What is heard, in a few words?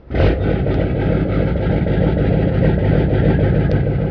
nautical